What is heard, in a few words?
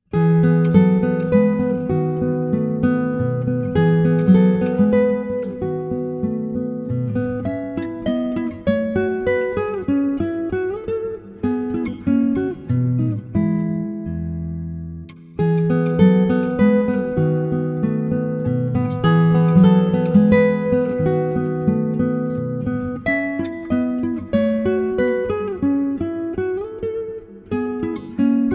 Classical, classical guitar